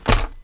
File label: Hit Rattle